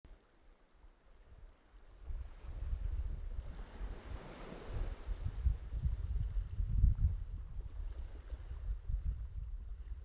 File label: Sea